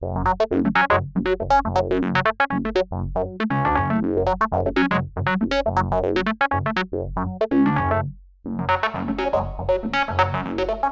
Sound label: keys dry room ambience